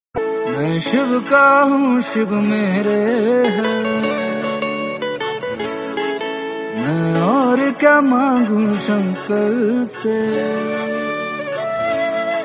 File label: devotional hindi